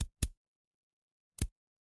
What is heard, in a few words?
ui click